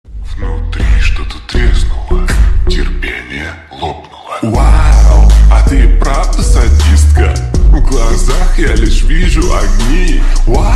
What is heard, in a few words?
slowed reverb